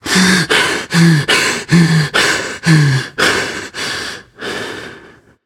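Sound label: breath, nonverbal; smite; voicepack; khepri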